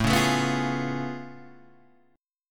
A Augmented Major 9th A down Guitar Half Step Down